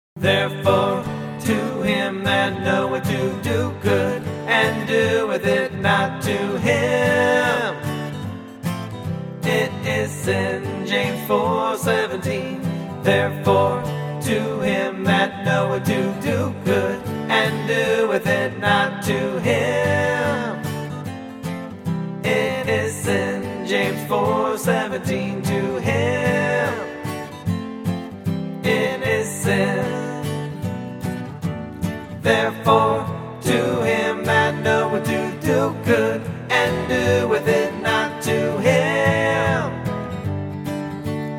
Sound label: Acoustic